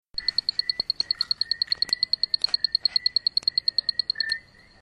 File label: Eleutherodactylus haitianus Valle Nuevo Dominican Republic; Central Mountain Rage, Dominican Republic, Hispaniola; Its voice is a sustained ticking.; Audio courtesy of Mr. Marcos J. Rodriguez; West Indian